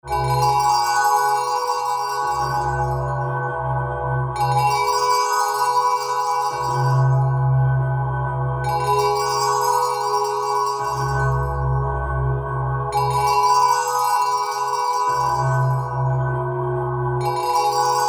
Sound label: ambitron gamelan loop